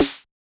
Yamaha DJX 2B, SD T9 H

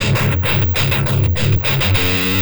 Treat Guit, Industrial Nasty

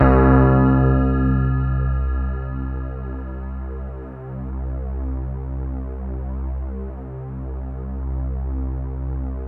C2, Synth Line; 90 s Sample CDs; NiceBeats; SynthLine VOL-31

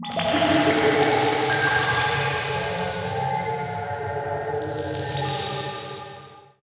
CAVERN; AKAI Anime Sound FX

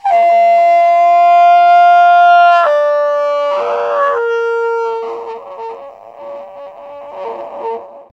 TRUMPE, Trumpet FX; Roland - LA Composer vol. 2

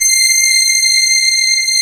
CMI ORGAN

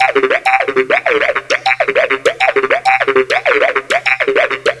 Sequence 100 G; Sonic Foundry; Hip-Hop Street Beats Construction Kit; SignalsII

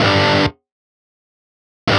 old skool stab

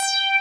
303 ACID BS; Pro-Rec - Synthsonic; BASSES

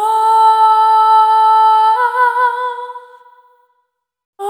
Single Voice Melodic Loops, Vocal Samples

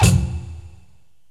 STACKED PERC

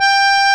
TACK PIANO

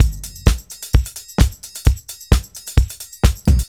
flat feet, Deep House 130bpm; Zero-G Deep House

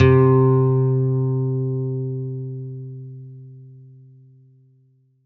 C2, CLUB ORGAN; Roland JV 2080

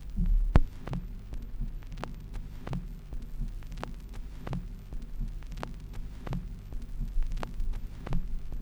Crackle, Vinyl Noise; noise hiss crackle

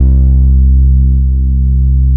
bass, 135 BPM, drum & bass; Ueberschall; Drum & Bass Flash Trackz

